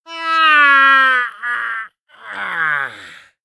scav death, voice; Escape from Tarkov; scav6

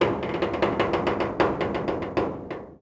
stress metal; World of Tanks Blitz; ambient canal

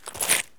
turn concrete; Escape from Tarkov

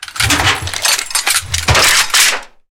reload cannon 20mm; cannons; War Thunder

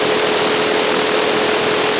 tracer medium, guns tracer; World of Tanks Blitz

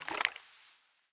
water bottle slosh; Rust

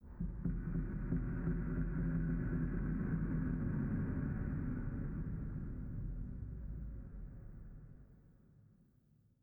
sting metal groan; Rust

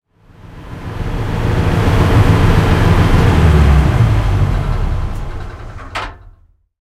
panzer3 engine int stop, tanks; War Thunder

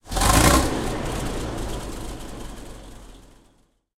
m1 engine load, tanks; War Thunder

